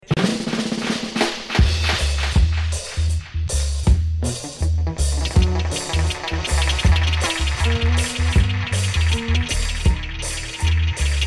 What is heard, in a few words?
Dub